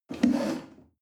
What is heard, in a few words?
chair wood take slide medium